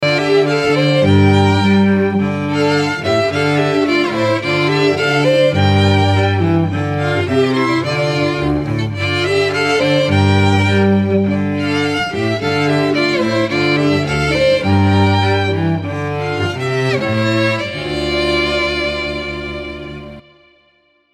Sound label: String Trio